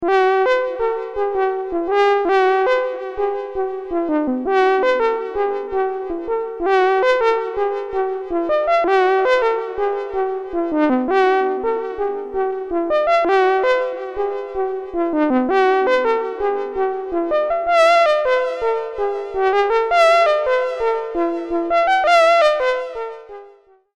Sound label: arp2600 soft Lead